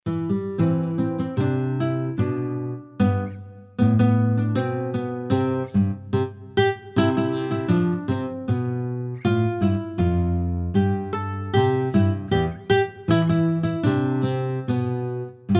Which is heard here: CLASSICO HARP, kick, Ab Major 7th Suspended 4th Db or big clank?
CLASSICO HARP